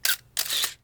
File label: camera shutter